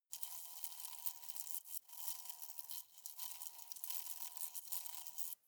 hornet hum glass aggressive long

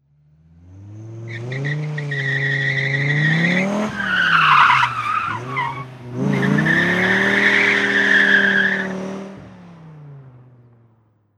dodge brake driving off

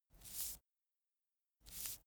cigarette sweep smooth

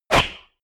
whoosh air

